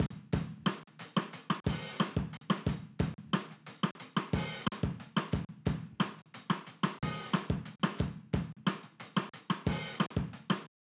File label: drum loop groove 90